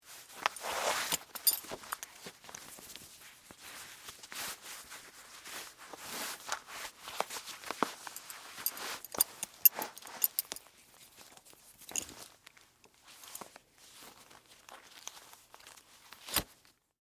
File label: Belt On, Foley, Clothes, Belt, Put, On; Dressing; Human; BlastWave